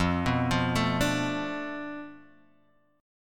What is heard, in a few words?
F 6th Flat 5th F down Guitar DADGAD, chord